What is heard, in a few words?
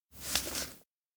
hands overlap on paper; anemoi